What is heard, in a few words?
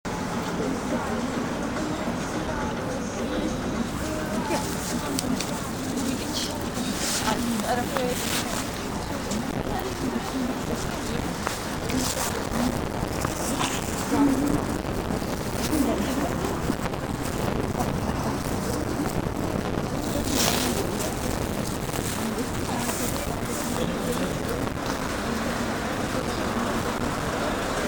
ankara spring street ambiance